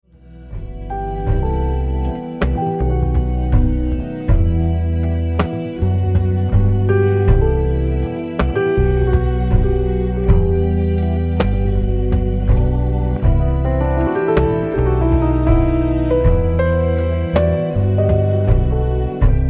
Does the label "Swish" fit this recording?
No